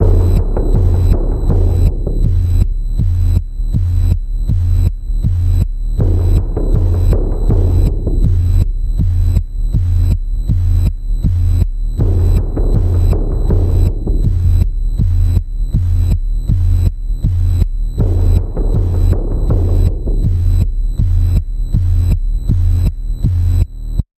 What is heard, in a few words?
Panning Pulse F, Key of F